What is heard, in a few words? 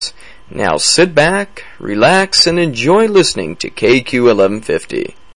Good Old Blues